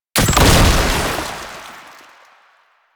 Lancer Death Explosion; Enemies; Soldiers; Warframe; Lasrian

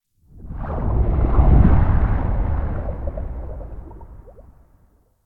Stalker Cave Underwater Whoosh; Ambience; Warframe

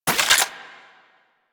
Anti Aircraft Gun Mech End; Weapons; Warframe; Archwing

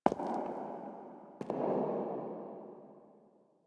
Steps Stand Up, Foley; Apostasy

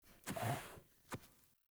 Wolf Steps Jog Start; Animals; Foley Actions

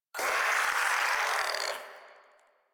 Shadow Vocal Attack; Warframe; Duviri

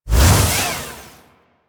Mech Melee Swing; Nechro

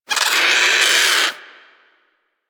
Avichaea Vocal Alerted; Warframe; Hunting Critters

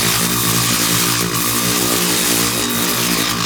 Ground Laser Loop; Enemies; Abilities; Warframe; Teralyst; Bigalyst

